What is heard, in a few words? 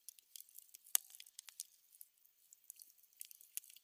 Campfire; outdoor; Camping